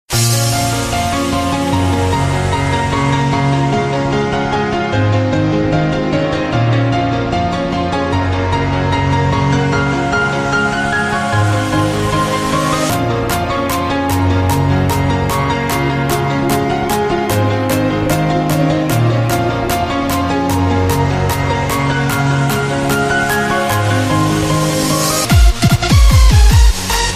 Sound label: music gameplay; geometryrash